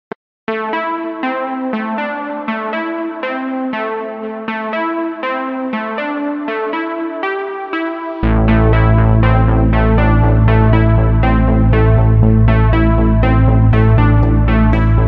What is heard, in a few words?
Synthwave; Retrowave